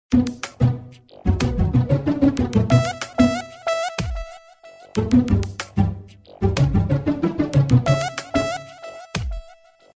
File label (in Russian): zabavnyy rington sms soobscheniya; podozritelnaya smska